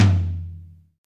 Hi Tom Single Hit F Key, Royality free tom sound tuned to the F note. Loudest frequency: 691Hz; tom drum samples